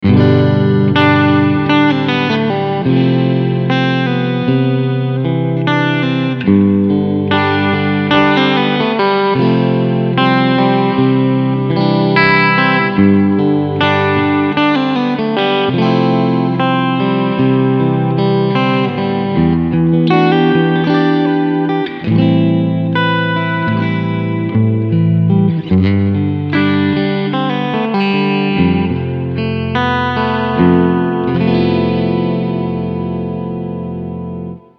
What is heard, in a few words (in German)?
Cleansound mit Box